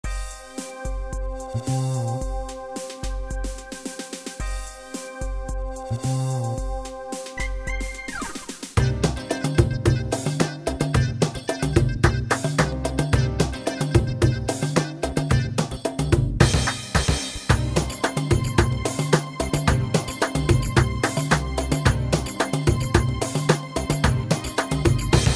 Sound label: SHAERY Cm; gw-7